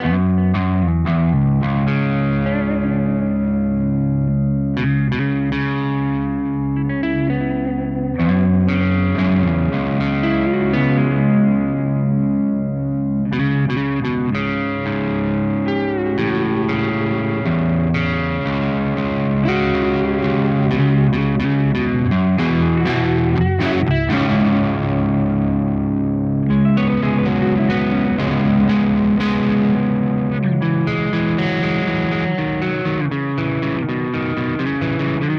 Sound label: Cort MBM 1 Peavey Both crunch